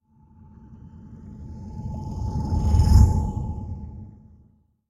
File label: sea object; Blind Drive